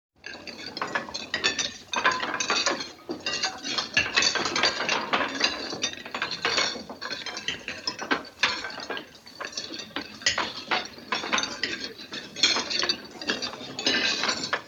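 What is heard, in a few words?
many people having lunch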